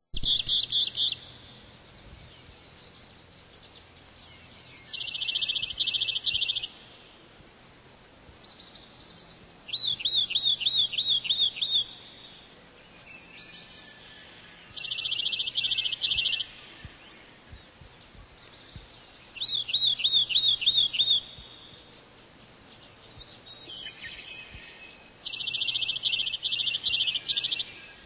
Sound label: Rock Wren; Birds